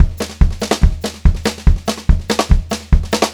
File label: 144 SPEED, Pop-Rock Drumloops; USB Soundscan vol.37; AKAI; 90 s Sample CDs